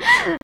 Grunt; Lewd SFX

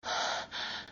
Breath; Lewd SFX

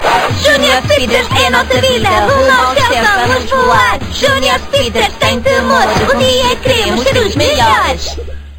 Chant, European Portuguese; Junior Speedsters Chant; Season 1

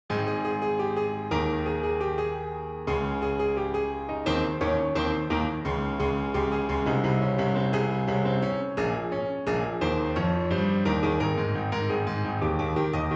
Piano

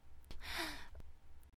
Breath; Lewd SFX

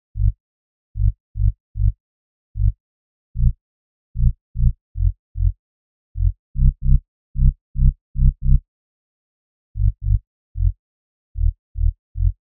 sub-bass; 150BPM

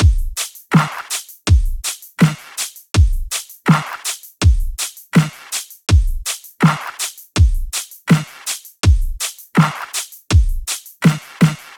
Beat main + punch, 81.5bpm; Pony Fan Music Remix Stems

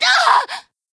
Short Scream; Lewd SFX

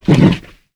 attack, Bullsquid; BUGBEAR

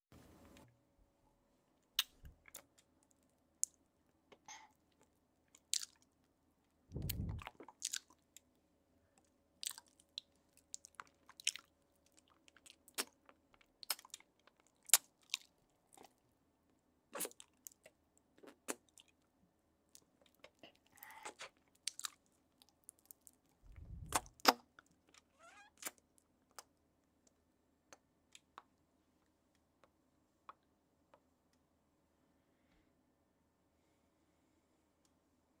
sucking; Lewd SFX

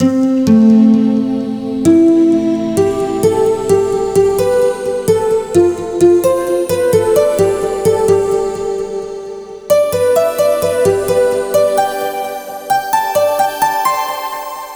Harp

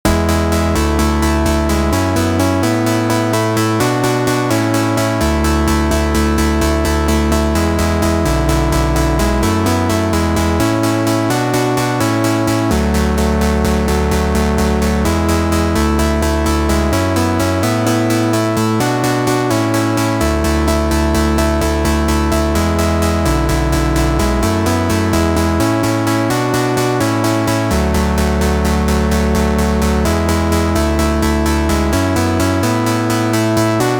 Strobe Open Cutoff

